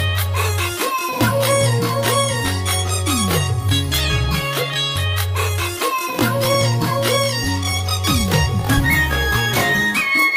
new cartoon ringtone

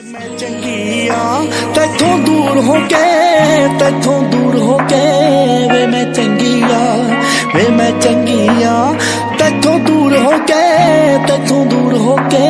beautiful punjabi song ringtone

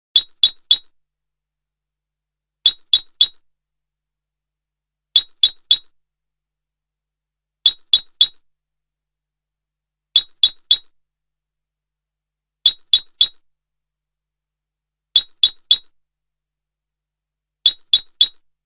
nokia blip ringtone

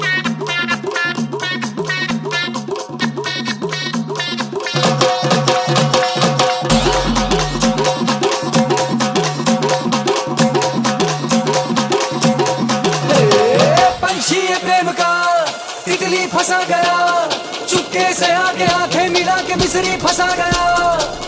ringtone bollywood ringtones

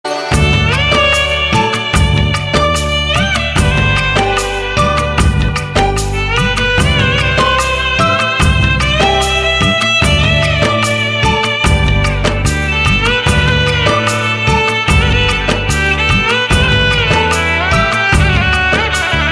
instrument ringtone; from diljale

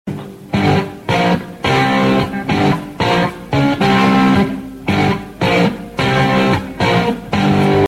rocking guitar ringtone

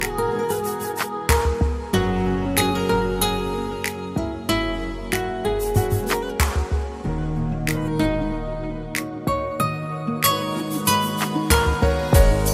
gaiter music ringtone, bollywood

